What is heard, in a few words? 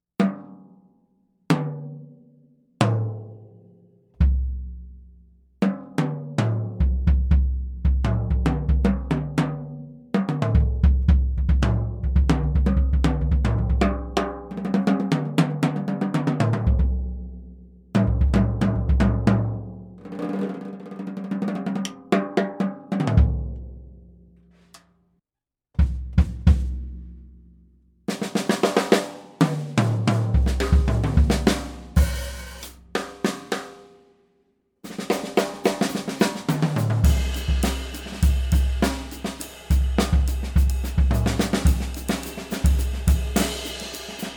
Gretsch Broadkaster Kit